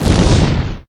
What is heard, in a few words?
flame big; wesnoth